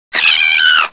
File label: headcrab pain; gearbox